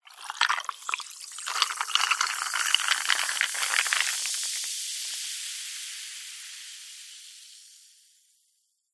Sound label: food drink soda cola pour into glass ice fizz